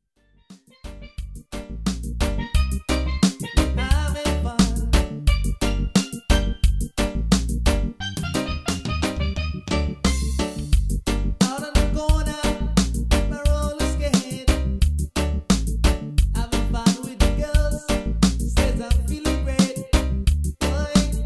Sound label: Steel Drum